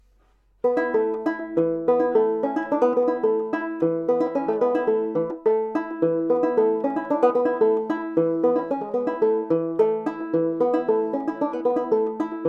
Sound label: circus jig, banjo; early fingerstyle banjo